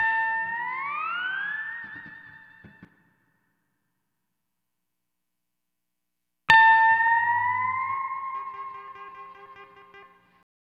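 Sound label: Steel Guitar D, Lounge; Zero-G Kocktail Kollection; Construction Kitz (Lounge); Volcano at Sunrise 074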